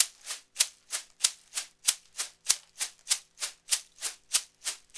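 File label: rainstick, percussion; ILIO; Synclavier Percussion 2; World & Orchestral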